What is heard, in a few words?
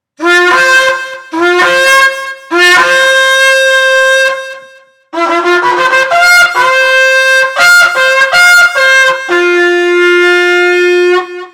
shofar